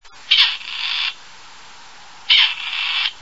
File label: Perdix perdix Variation